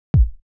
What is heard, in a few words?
Kick Hard; hydrogen